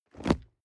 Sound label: nylon leather bodydrop roll